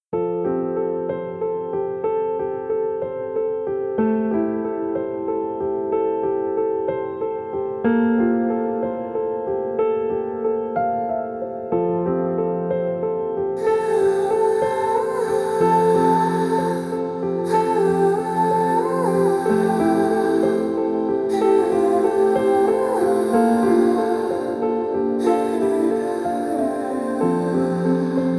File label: Instrumental